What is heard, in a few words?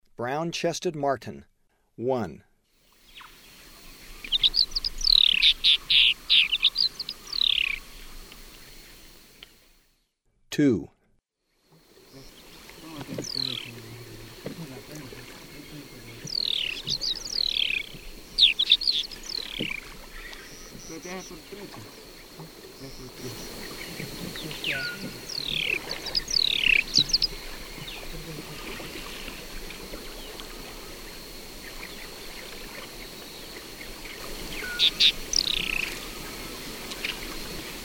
Brown-chested Martin; S. America; Eastern Lowlands